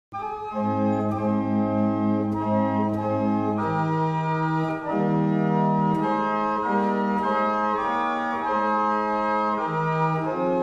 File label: barrel organs; Tytherley; Portugal New